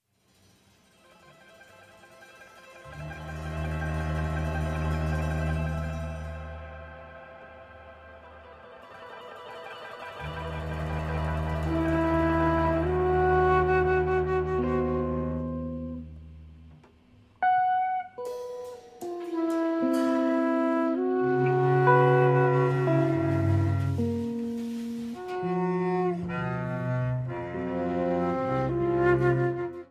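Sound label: 5 movement for string Quartet 4th Movement; music of webern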